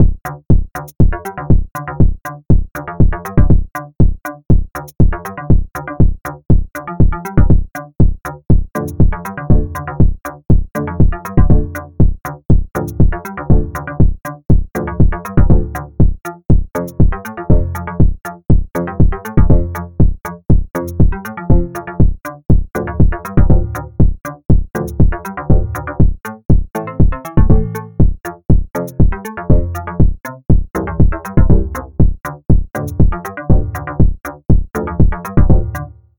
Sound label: nanoloop mobile train beat; weekly beats 2020